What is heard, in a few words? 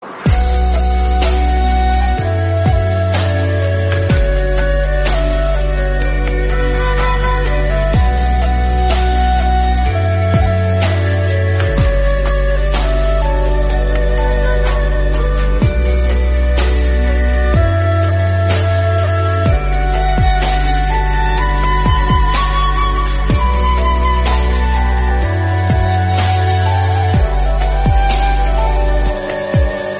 Lofi